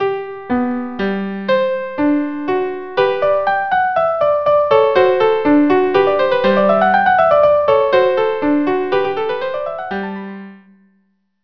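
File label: violin viola duo; KV 487; minuet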